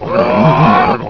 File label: CHARS SOUNDS BERSERK; valhalla